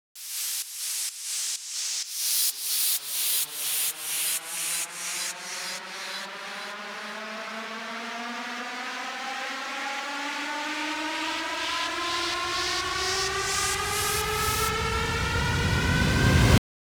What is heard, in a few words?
128BPM Full Fx Riser; Vengeance - Essential Clubsounds Vol.5; Demo Constr Kits; Blue Eye Kit Root G